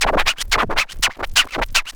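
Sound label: SCRATCH FILLS; AMG Megabass REMIX! VOL-1 & 2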